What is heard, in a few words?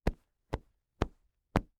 Cloth Fluff Pillow Hard; household